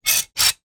industrial metal caulking gun mechanism stiff slide